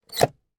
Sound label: van fan duct vent direction up down dial mercedes sprinter; transportation; sound effects; sound effects one